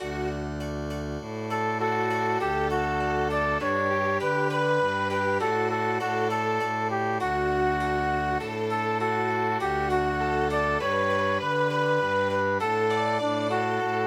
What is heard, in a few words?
Hymn Chamber Music